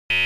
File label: Buzzer Fst Resonant